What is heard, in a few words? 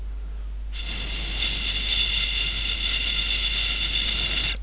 pipe